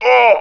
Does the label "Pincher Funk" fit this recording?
No